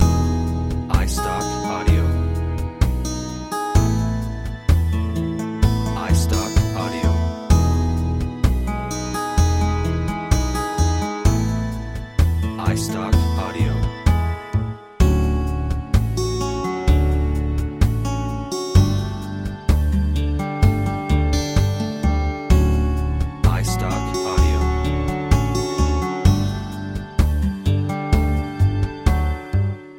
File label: Spring Season Loop, Loop-ready file, lounge; Music Clip; Instruments: Acoustic guitar, strings, upright bass (contrabass), vintage drum k